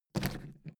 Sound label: suitcase lift up